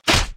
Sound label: hit; Clicker Heroes